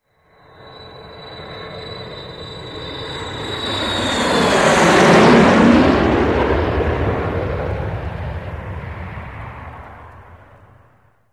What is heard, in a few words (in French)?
Avion, Un avion